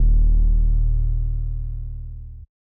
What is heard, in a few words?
808s